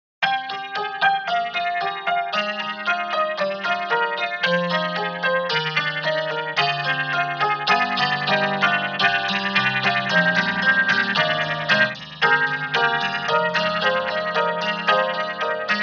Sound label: Tack Piano Vinyl; Spectrasonics; Keyscape; Wing